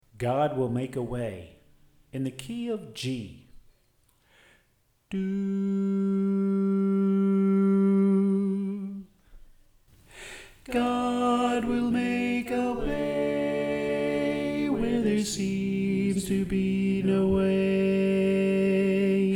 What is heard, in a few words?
Tenor